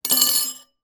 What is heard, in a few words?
household cutlery single item down on plate or dish